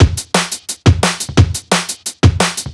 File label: DRMLP, Drum Loops; neuro; Stanza